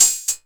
Hat Delay, TR-606 Bent Samples; Sonic Foundry (Sony Creative Software); The Electronic Music Manuscript Collection; Battery 3 Sample Kits

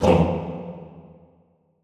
TONG E1, Classical Choir; Best Service; Extended Classical Choir; SHORT TONG

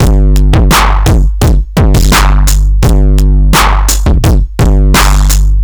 Beat Fuzz 85, distorted drum loops 85bpm; musicradar

